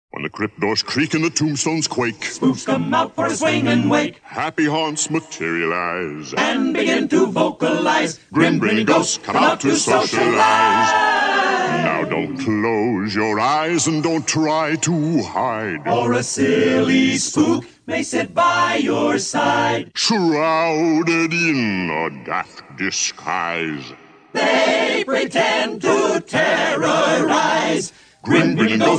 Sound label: Singing Busts; Haunted Mansion